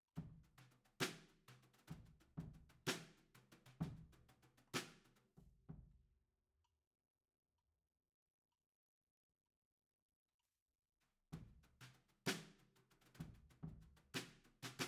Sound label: Overheads, 96bpm